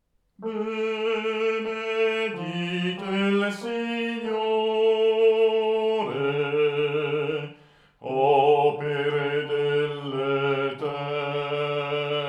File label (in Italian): BENEDITE IL SIGNORE Bassi